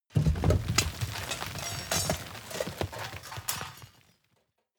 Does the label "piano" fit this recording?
No